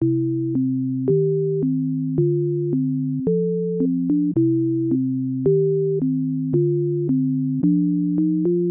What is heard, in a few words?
Mission Failed; Toast Time Smash Up